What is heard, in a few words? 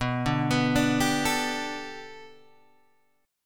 B Minor 7th Sharp 5th B Guitar Open G, chord